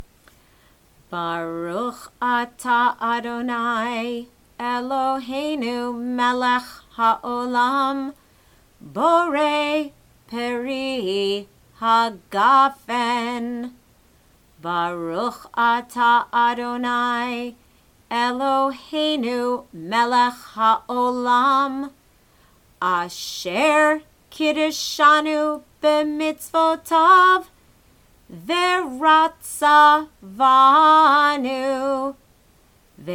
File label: Kiddush chanted slowly; religious school; student prayers